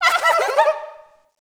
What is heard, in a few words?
chasing